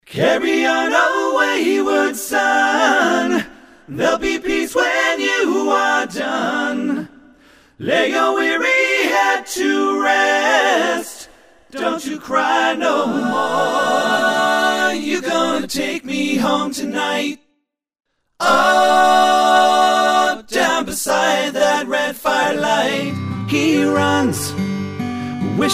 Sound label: Harmony Montage